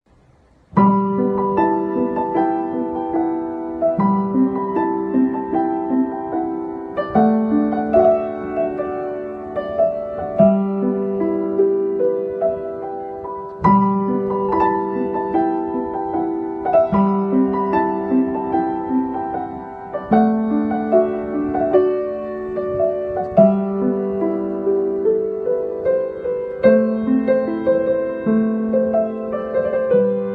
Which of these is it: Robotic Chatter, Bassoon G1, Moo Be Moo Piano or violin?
Moo Be Moo Piano